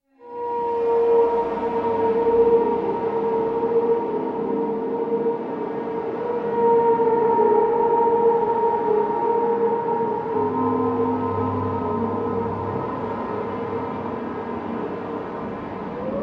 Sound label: Drone Mystical Tonal Eerie Atmosphere Vocal Creatures; Drone Mystical Tonal Ominous Eerie Atmosphere Vocal Creatures; Drones; Sound Design